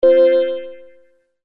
synthlead; shanghai

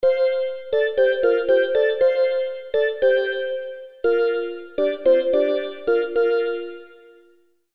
Shanghai melody, synth lead

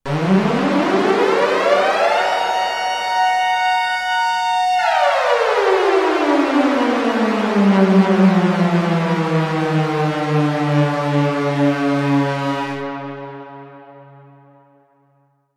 Outer Space Alarm

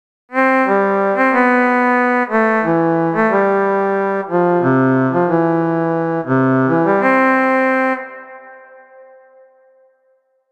Nasal, synthlead

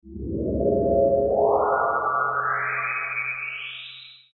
Flying saucer

